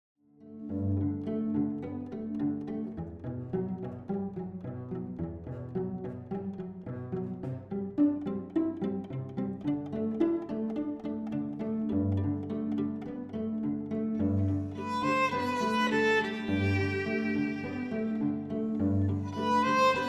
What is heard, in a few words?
Strings; Athena Strings